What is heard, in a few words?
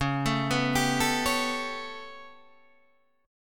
B 7th Suspended 2nd Sharp 5th, chord